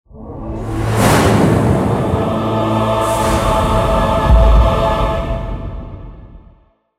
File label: thunder choir